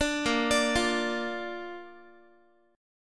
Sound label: Bb Major Bb down Guitar, chord; arpeggiated